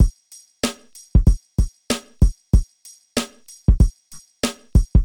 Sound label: drums